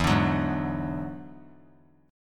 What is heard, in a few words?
E 9th E down; E9 strummed; JGuitar